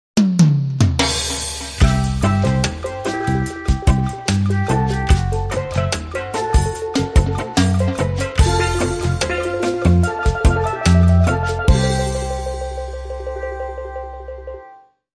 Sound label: Steel Drum Dub